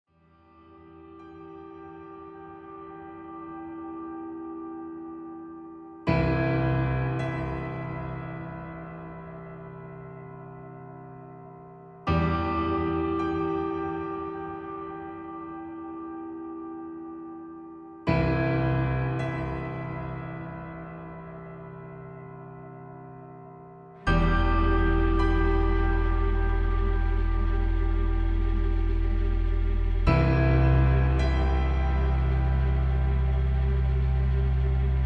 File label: Ambient Film